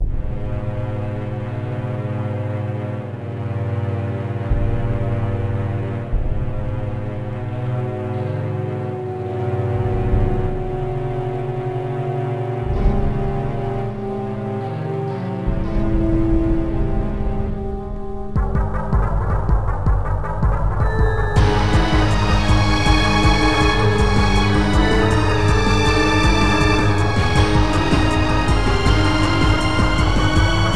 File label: Story Music; Bob the Fish 1.6